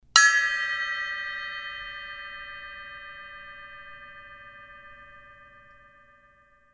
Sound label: zildjian